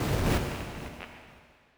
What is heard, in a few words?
Magic